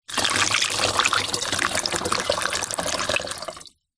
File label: household water pour into plastic funnel